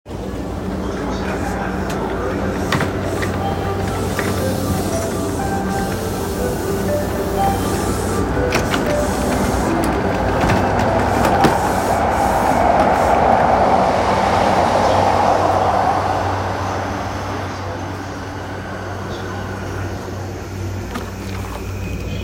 JR 山手線 日暮里 CW, train sounds

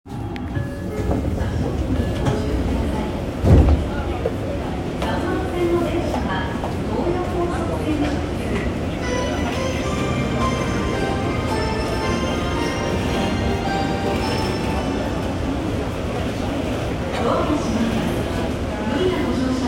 東西線大手町東, train sounds

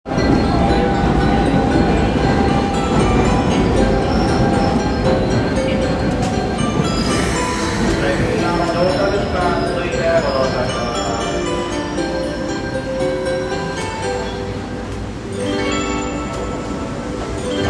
京急本線品川南接近

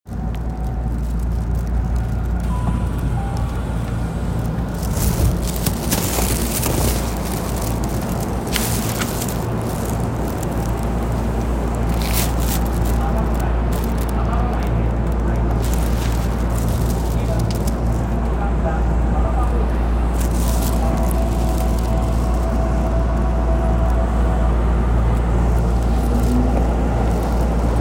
東急大井町線旗の台西, train sounds

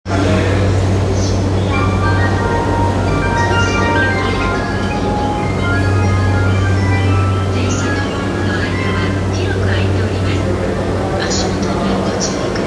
JR山手線渋谷CCW, train sounds

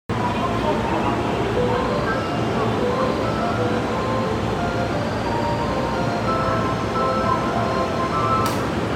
京浜東北線港南台, train sounds

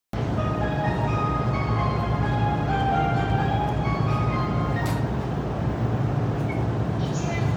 JR京葉線稲毛海岸東, train sounds

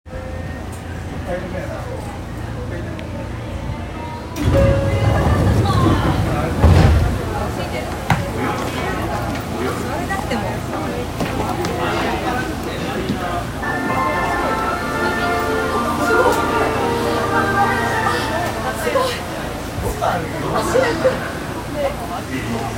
有楽町線豊洲西, train sounds

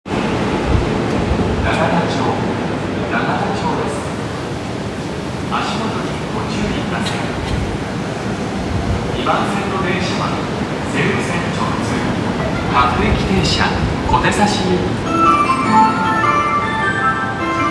有楽町線永田町西, train sounds

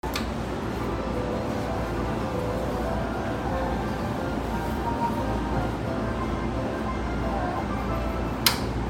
京浜東北線陽光台北, train sounds

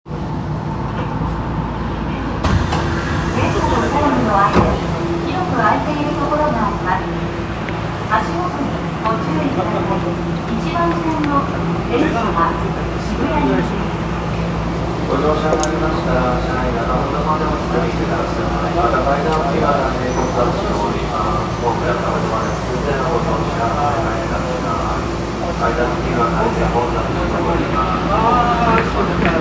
銀座線上野駅西, train sounds

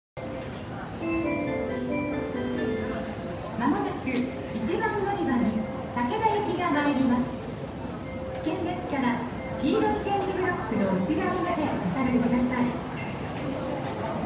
京都市営地下鉄烏丸線烏丸御池南, train sounds

